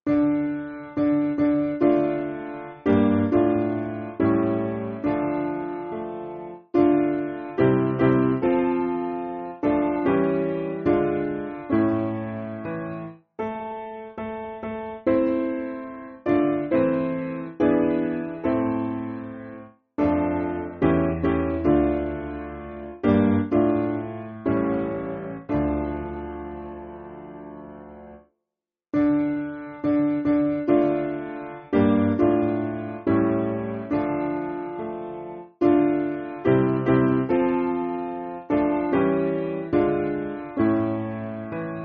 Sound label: Piano; Lord Speak To Me; Llef